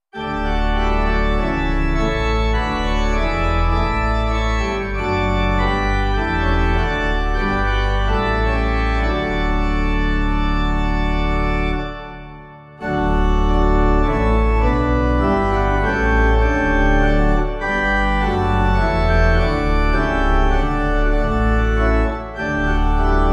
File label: Hymn organ; God of saints to whom the number; Halton Holgate